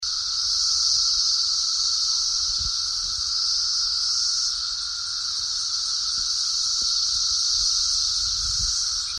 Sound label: cicadas; brood x cicadas; county parks